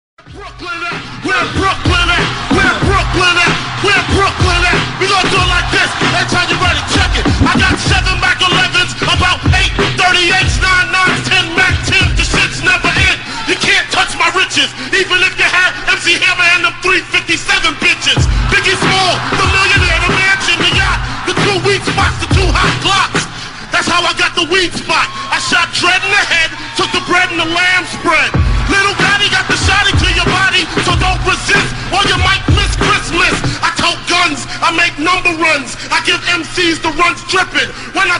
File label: battle rap